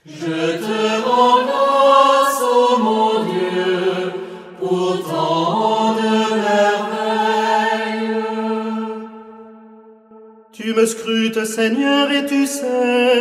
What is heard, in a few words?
ensemble vocal, psaume 138; hilarium; je te rends grace pour tant de merveilles